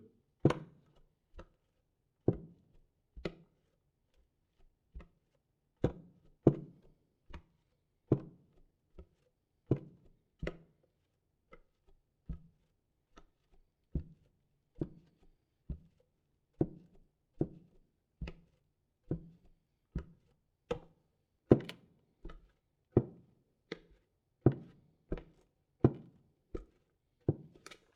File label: Normal Wood Barefeet Walking; Todd Blankenship